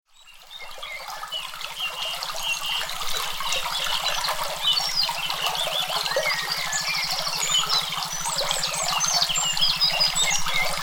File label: Alpha Meditation Brainwave Entrainment